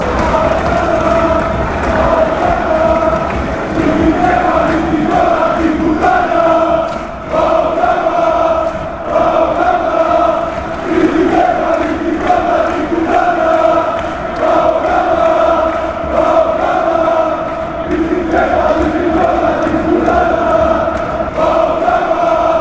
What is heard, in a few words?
panathinaikos chant